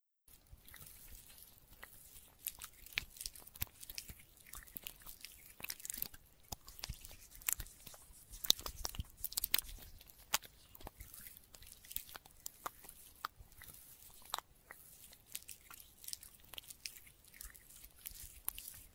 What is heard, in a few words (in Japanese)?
マッサージパート(右耳、左耳), ASMR